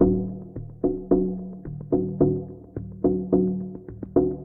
Gravity Werks Kit 108 bpm, Drums; MIDI Clips; Ableton; Mood Reel

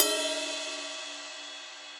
Ride Bell DarkPlateVerb; Drum Hits; Standard Hits; Ableton; Factory Packs; Session Drums Club

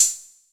Hihat Combo 606; Drum Machines; Drum Hits

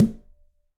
Tumba A Mute, Conga; Latin Percussion; Drums; Drum Hits; Ableton; Factory Packs

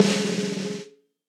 Snare Press Old School; Snare Articulation; Drum Hits; Session Drums Studio; Standard Hits

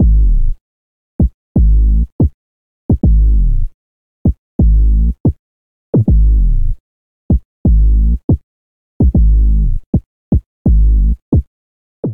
New Old Sub Ab Minor 79 bpm, Bassline; MIDI Clips; Tonal; Ableton; Factory Packs; Chop and Swing

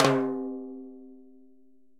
Timbales A L Flam, Latin Percussion, Drum Hits; Ableton; Factory Packs

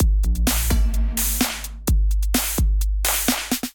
Kit groove 128 bpm, Drum; MIDI Clips; Main Frame Kit groove; Ableton; Factory Packs; Drum Essentials

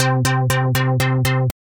FOF Bass Pulse, Wavetable, Synth Rhythmic; Instruments; Ableton; Factory Packs; Synth Essentials

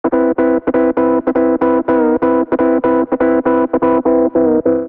Muddy Whirl Piano Chords A minor 80 bpm; MIDI Clips; Accompaniment; Ableton; Chop and Swing

